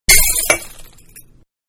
Light Bulb-Smashed; Smashes (Sound Effects:Objects); Manhattan Production Music

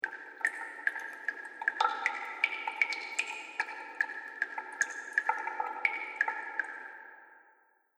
Water Fantasy Cave Drips; Dripping Sound Effects; Agcnf Media

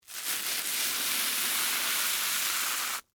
Scrape Cereal, Corn, Flakes; Texture; Scrapes (Sound Effects:Objects); BlastWave

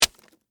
Impact Celery, Texture, Impact, Celery, Vegetable; Texture, Impact, Celery, Vegetable 2; Miscellaneous Impact

